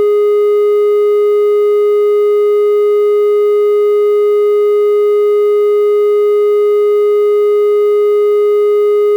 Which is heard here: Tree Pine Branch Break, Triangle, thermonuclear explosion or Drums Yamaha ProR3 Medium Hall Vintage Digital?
Triangle